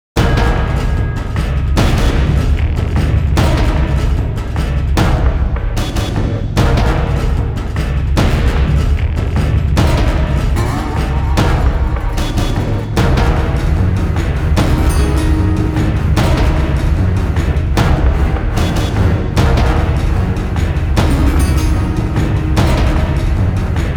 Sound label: AI Alarmed